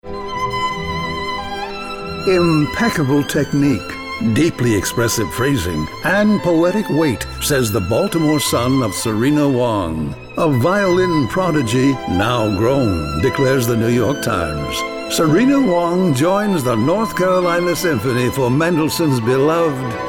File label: VIOLIN; MENDELSSOHN